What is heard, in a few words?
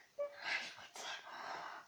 click